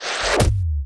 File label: Spikes Grounded; Grimm